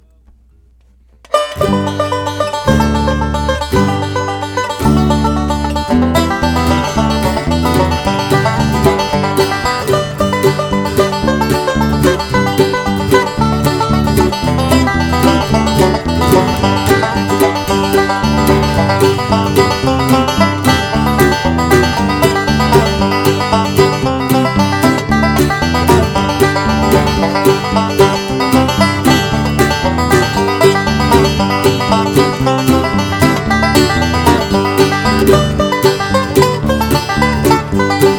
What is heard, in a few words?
banjo signal, bluegrass